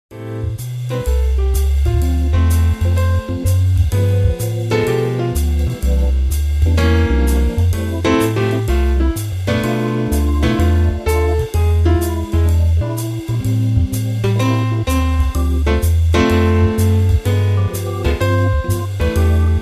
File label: Dueling Keyboards